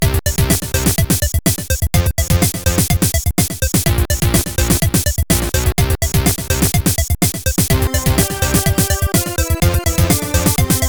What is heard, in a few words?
Boss Victory!; virocop amiga